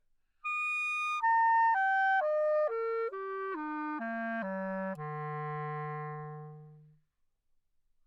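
classical clarinet bb arpeggio descending, Anechoic; OPENAIR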